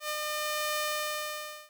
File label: flat line